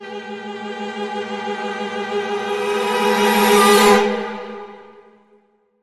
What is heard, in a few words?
Eccentric Thrilling Min Cres